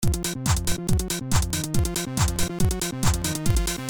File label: toxic vibes